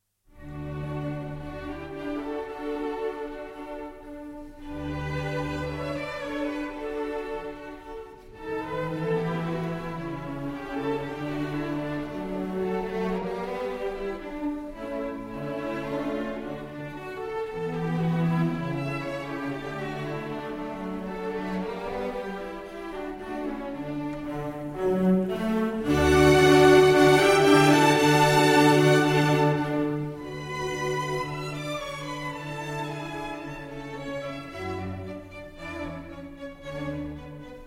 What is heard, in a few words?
Cello Concerto in D Major, Allegro Moderato - Cadenza; Haydn; Hob. VIIb2